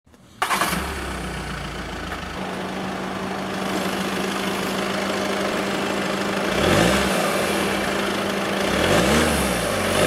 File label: Volkswagen Caravelle